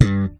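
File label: slap pop G 2, Pedulla bass; Masterbits CLIMAX 7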